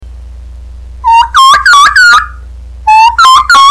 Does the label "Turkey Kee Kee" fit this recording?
Yes